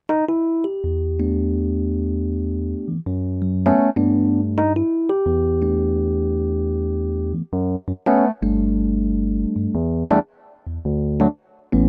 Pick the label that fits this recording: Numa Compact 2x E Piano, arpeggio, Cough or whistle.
Numa Compact 2x E Piano